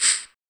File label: RSS PERC